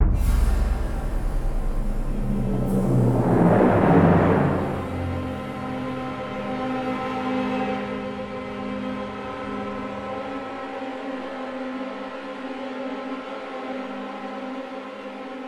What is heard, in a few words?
Music Tension; Hunted: The Demon's Forge; x360